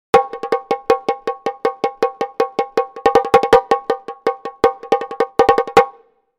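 Tune 2, Tsukeshime-daiko, Raised 2 semitones with pitch-shifting; taiko; TAIKO THUNDER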